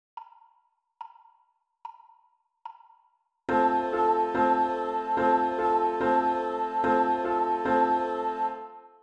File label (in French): Rythme Bas Voix et Piano; formation musicale; 2-3 ans jardin musical